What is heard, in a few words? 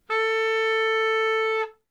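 clarinet pitch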